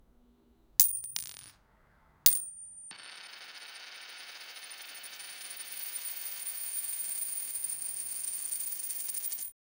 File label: coin through ground sound